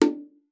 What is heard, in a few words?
BONGO, BONGOS; Xsample VOL-9